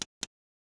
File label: m4a1 Attach silencer